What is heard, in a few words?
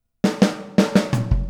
Boogaloo Intro Full Mix, Drums, 82 BPM